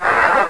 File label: Whir